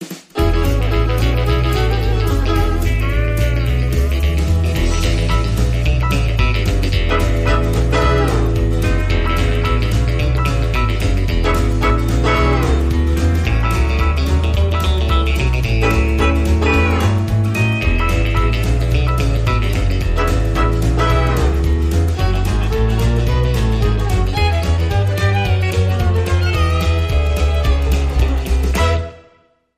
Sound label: Walking Bass